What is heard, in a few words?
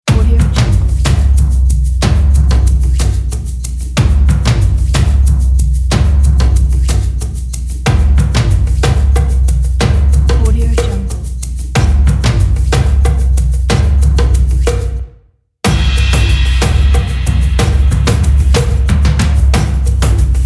upbeat percussion